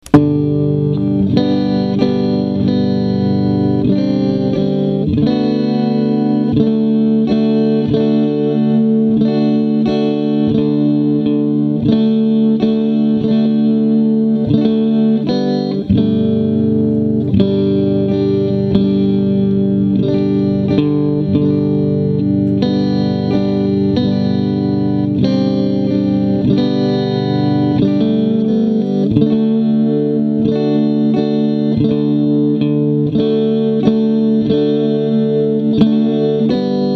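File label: hush little baby play along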